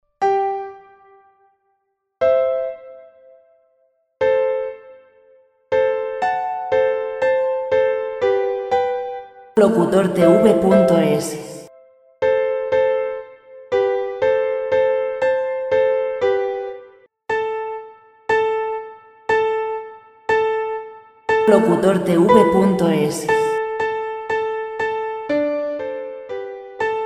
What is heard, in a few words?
90 classic; fidelio; Beethoven